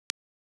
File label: ideal steel wood combined, steel ball wood ground